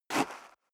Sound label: step high heels gravel light single left; schritte; Footsteps; menschen